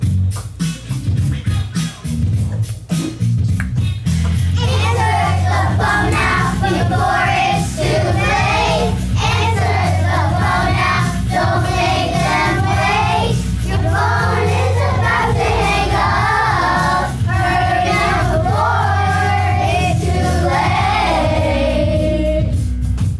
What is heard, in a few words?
ringtone